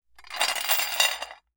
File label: Metal; TOOLKIT vol.2